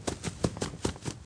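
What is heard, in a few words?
run right to left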